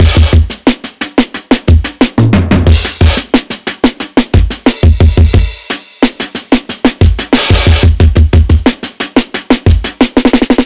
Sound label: Finger Drumming